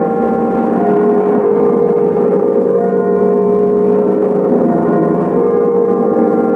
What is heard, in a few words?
Lo Fi Church bells; Movie Bits